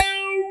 OWW BASS, JV 1080; Pro-Rec - Synthsonic

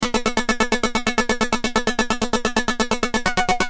Keys, Industrial

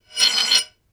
Potters Wheel, Drum & Bass Hits & Bits; Total Drum&Bass; 90 s Sample CDs

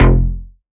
Bass F, Bass Synth

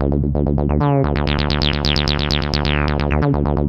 D2, synth line; 90 s Sample CDs; NiceBeats; SynthLine VOL-3

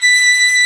STR VLN, Str Quartet, Strings

